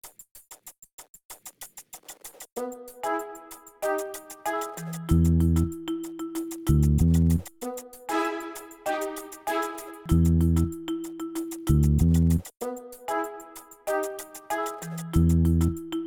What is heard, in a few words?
Simple Comedy Loop; bakugan champions of vestroia 2020; switch gamerip